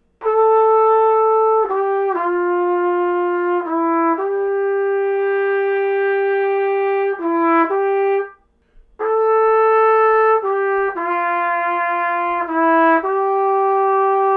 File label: Trumpet